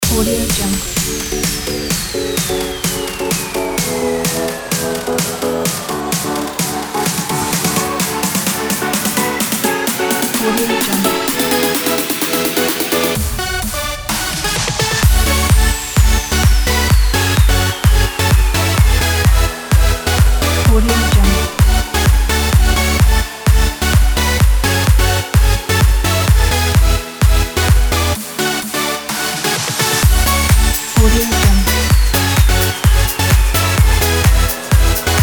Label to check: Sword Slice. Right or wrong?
Wrong